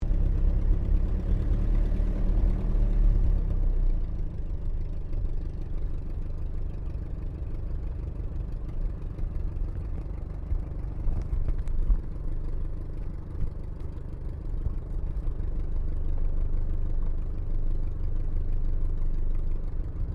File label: AIRCRAFT DH82A DEHAVILAND TIGERMOTH IDLE INTERIOR; RAAF Tigermoth; Airplanes (Sound Effects:Vehicles)